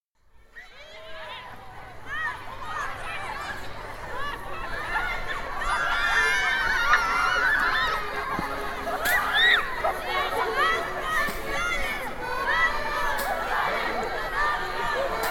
maslenitsa; abramtsevocollege

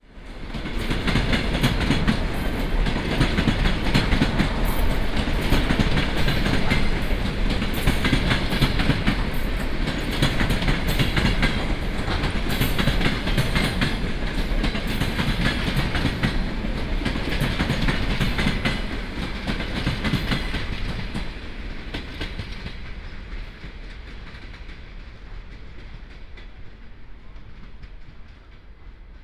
railway pattern; sergyevposad

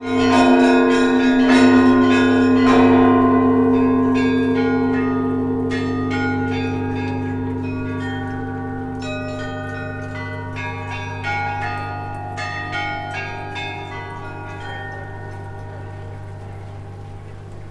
chimes; sergiyevposad

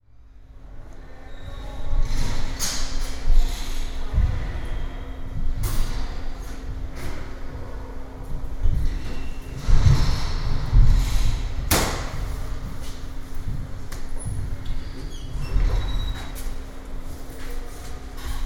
basketball, sergiyevposad

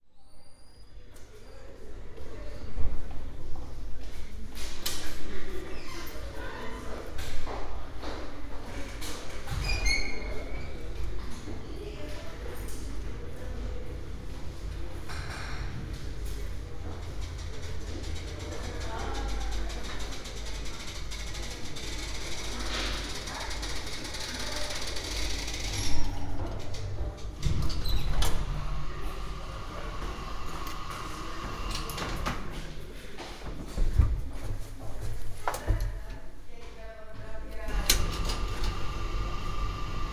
lift; tvr lift; sergiyev posad

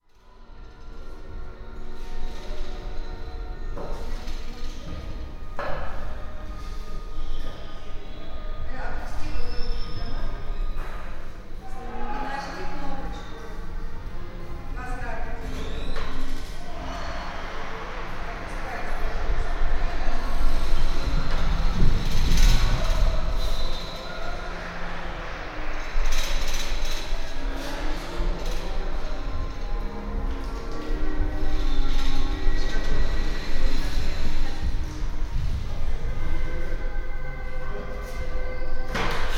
sports hall, sergiyev posad

